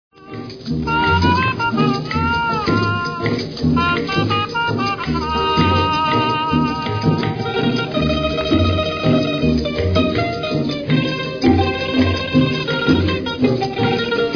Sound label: Maraccas Rumba